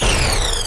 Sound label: ship destroyed; TumikiFighters